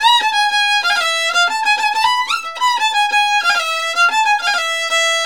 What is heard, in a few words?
VIOL, Balkanish Traditions; USB Soundscan vol.63; AKAI